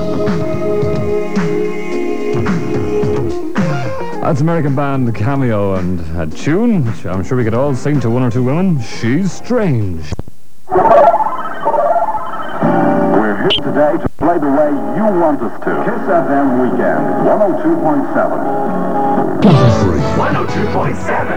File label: kiss nova jingles